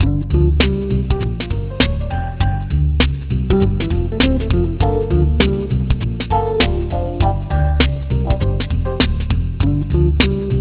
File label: eolien, jazz; groove